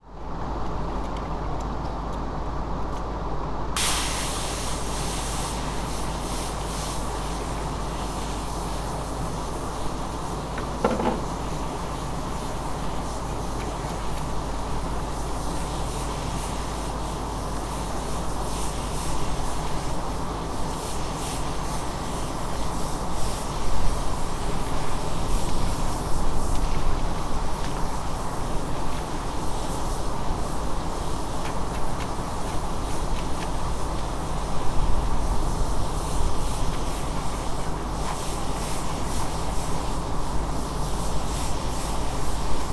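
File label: decontamination, Fukushima Soundscape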